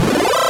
fanfare

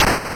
various, explosions

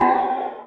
screams human

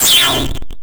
error

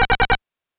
alarm loop

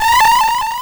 loop

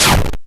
hard, explosions

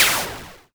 soft, explosions

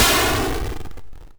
medium, explosions

